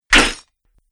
Hand Axe Clangs Chainmail Armor; Battle Sound Effects

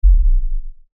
LFE Ultra LFE Hit, Lfe Ultra Low Bass Hit Impact; Deep Tone; Sound Design

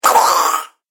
Horror Gremlin, monster

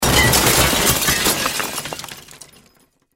Crash Glass Rock Wood Debris Large Short; Blastwave

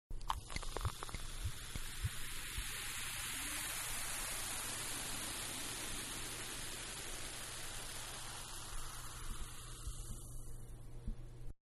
Champagne Pour; Bars; Manhattan Production Music

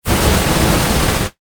Science Fiction Flame Booster Short Burst; Spacecrafts

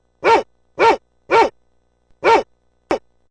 Dog barking sound effect of four barks.; Big Dog Barking SFX; Dogs (Sound Effects:Animals)

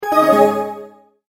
Multimedia Tone-Return/Back

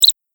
Pickup App Game; Video Game Sound Effects

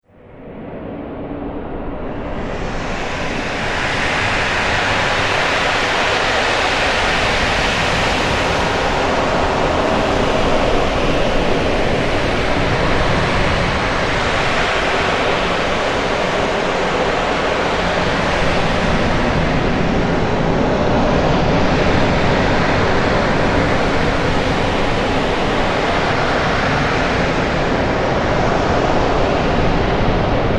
Drone Waves Of Torment, drone sound effects

